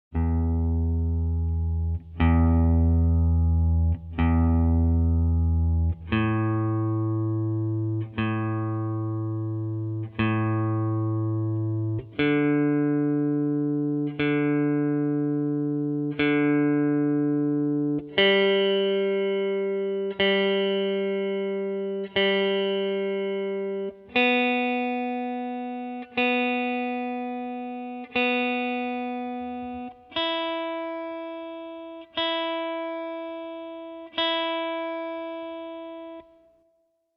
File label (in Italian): ACCORDATURA; unit settimana 2